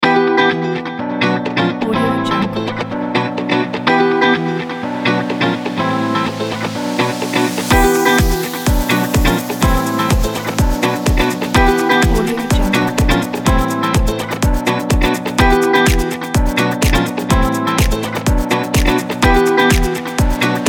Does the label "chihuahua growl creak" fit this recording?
No